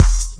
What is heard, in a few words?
slice